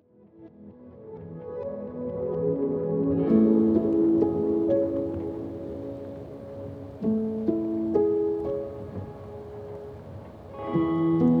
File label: lofi hip hop